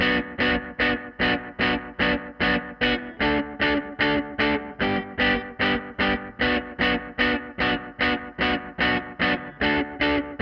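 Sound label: Amp Guitar B minor 150 bpm; Loops; Tonal; Ableton Live 12 Lite; Core Library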